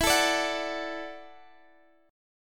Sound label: E 7th Suspended 2nd Suspended 4th E down Guitar Standard; E7sus2sus4 strummed; JGuitar